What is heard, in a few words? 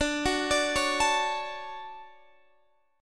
D Major 7th, D, down, Guitar DADGAD